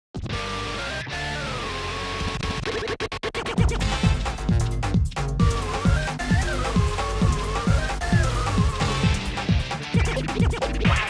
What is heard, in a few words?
Release Trailer